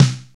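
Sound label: RX7 normal snare drum; Crypton - DrumBase5000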